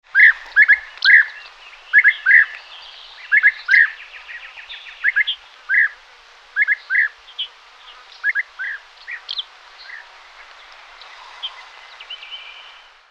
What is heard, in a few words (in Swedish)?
Biatare